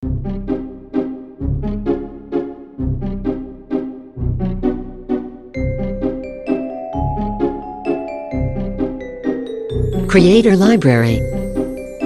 Freak Valse, cinematic music

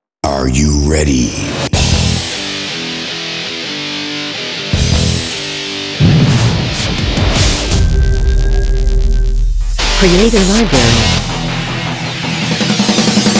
Energetic And Heavy Guitar Opener; Rock

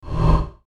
Short Swoosh

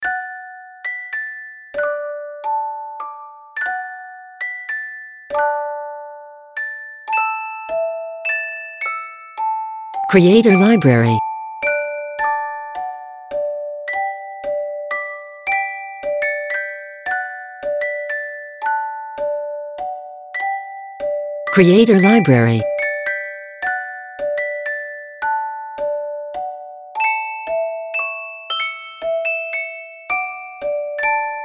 music box; Silent Night; Holiday Seasonal

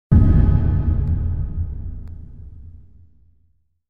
Trailer Hit

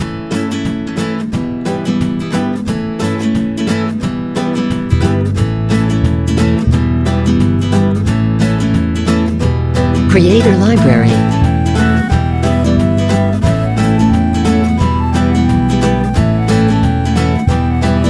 Dancing In The Wood, Folk, Acoustic music

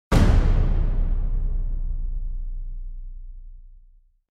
Trailer Hit

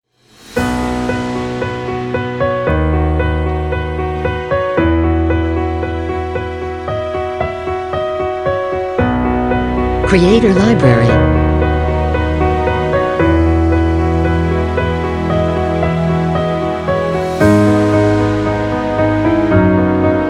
Romantic Piano, Cinematic; Creator Library

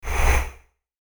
Short Swoosh

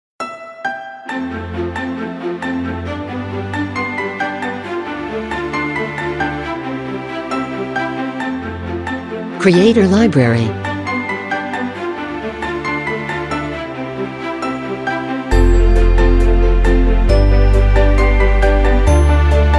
A Bright And Hopeful Future, corporate music

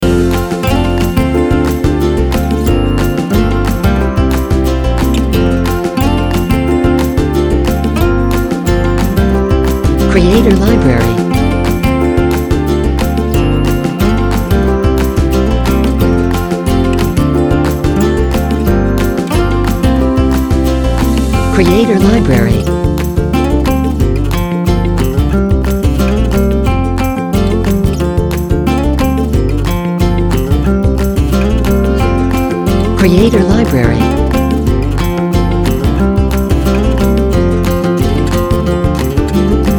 A Happy, Folk, Acoustic music

